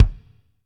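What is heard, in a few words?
bass drum, BD Real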